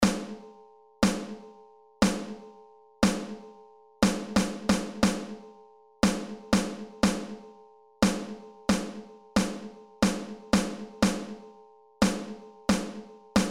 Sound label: Rhythms Snare Drum